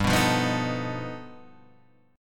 G Augmented 9th, Guitar, Standard

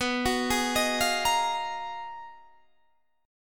Ab Minor 13th, B, guitar chord

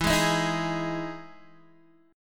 E Major 7th E down Guitar DADGAD

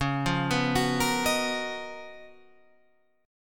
C sharp 13th C sharp, Guitar, Standard; arpeggiated; C#13; JGuitar

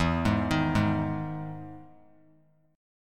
E Major 7th E down; EM7 arpeggiated; JGuitar

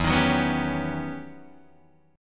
E Minor Major 13th E Guitar DADGAD; EmM13; strummed; 2,6,4,4,4,5

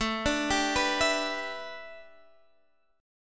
A 7th Suspended 2nd Suspended 4th Guitar Standard; A7sus2sus4 arpeggiated

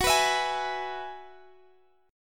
Ab Minor 7th Sharp 5th

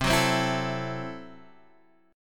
C 6th Flat 5th, C, down, Guitar, Half Step Down

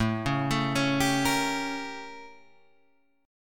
A 7th Flat 9th A, Guitar Open G

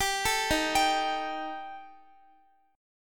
Eb Major Flat 5th Eb Guitar; EbMb5 arpeggiated

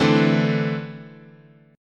D 7th Suspended 2nd, guitar chord

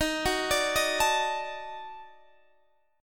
Eb Minor Major 7th Flat 5th, Guitar Drop D

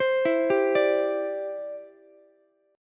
E Minor 7th Sharp 5th, Ukulele; 17,16,15,17; arpeggiated; JGuitar

